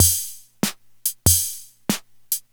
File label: Swing 95 bpm; Casio CT-605 Rhythms